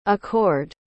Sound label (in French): accord